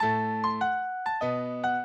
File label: minuet, mozart